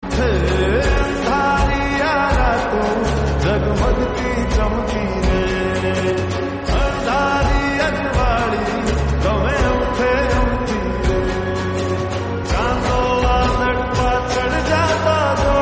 lofi

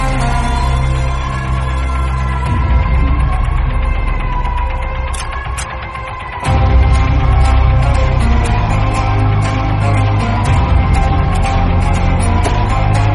entry bgm; kgf 2; rocky

